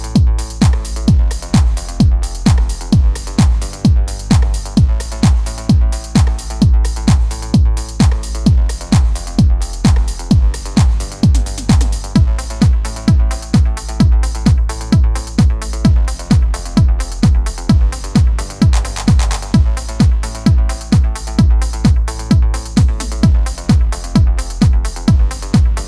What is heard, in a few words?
Fender Strato